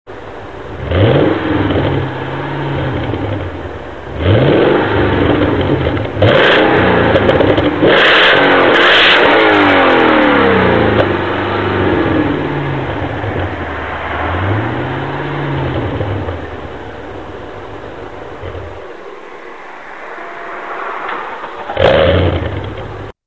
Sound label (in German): Auspuff; S6